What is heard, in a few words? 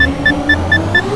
displacer spin, weapons